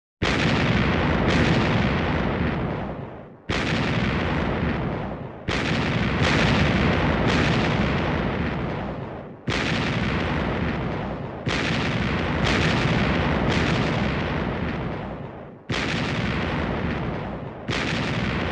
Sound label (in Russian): Zalpy pushechnye